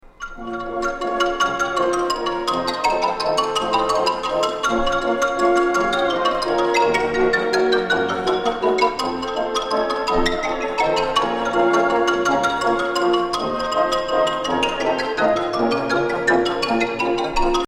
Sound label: bolero trio